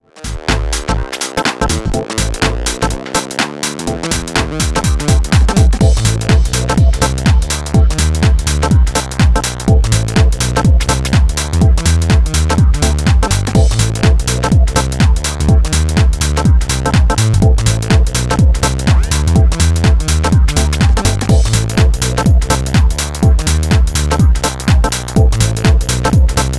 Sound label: House, Nu Disco, Balearic